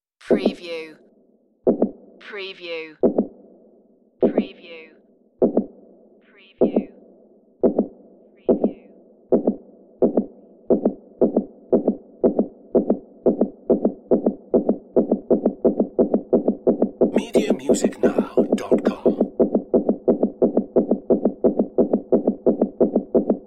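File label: Heartbeat Speed Up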